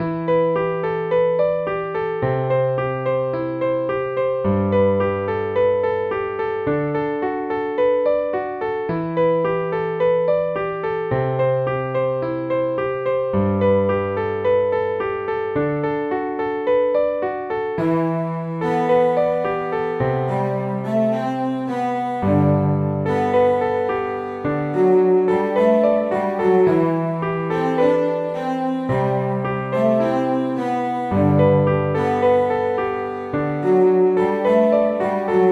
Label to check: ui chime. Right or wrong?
Wrong